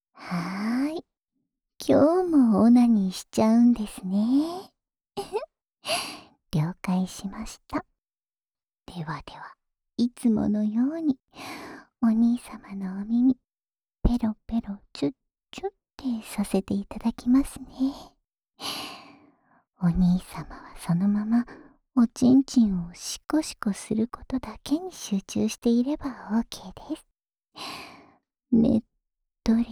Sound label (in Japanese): オナサポ用耳舐めループ（右耳）, ASMR